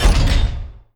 Quest Sub Track Complete; Custom UI Sounds; Call of Duty Black Ops 2

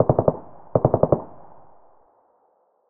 ak74 burst double; weapons; SPT Battle Ambience

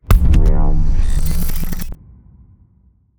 Quest Finished; Custom UI Sounds; The Division 1

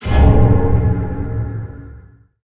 Button Bottom Bar Click; Custom UI Sounds; Dark Souls 3

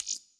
Button Over; Custom UI Sounds; Call of Duty Black Ops 3

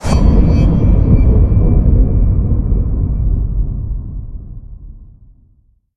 Achievement Completed; Custom UI Sounds; Dark Souls 3

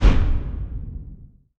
Menu Inspector Window Close; Custom UI Sounds; Elden Ring

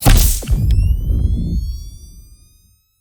Generator Turn On; Custom UI Sounds; Cyberpunk 2077

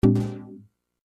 Backpack Close; Custom UI Sounds; SEGA Dreamcast

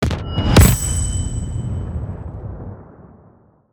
Repair Complete; Custom UI Sounds; Call of Duty Vanguard

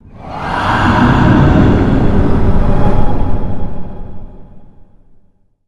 Quest Started; Custom UI Sounds; Bloodborne

